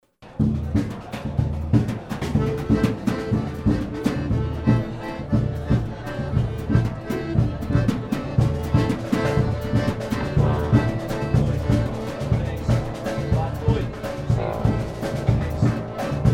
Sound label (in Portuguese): Forro